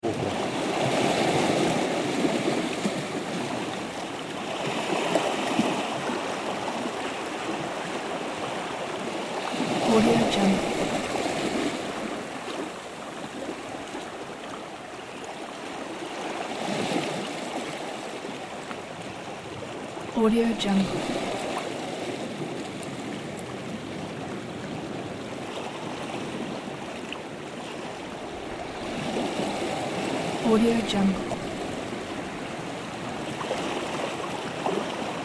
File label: gentle ocean waves in cornish cove